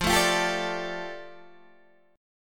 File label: F 6th Add 9th F down Guitar Open D, chord